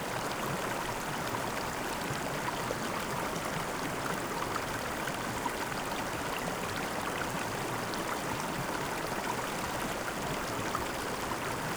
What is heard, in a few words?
River